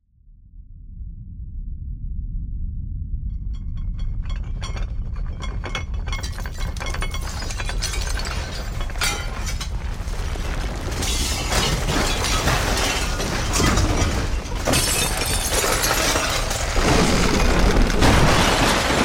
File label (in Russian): vnutri doma razrushenija; Звук землетрясения в доме; Звук землетрясения в доме, когда трясется мебель, падает посуда с полок, звуки скрипа, гул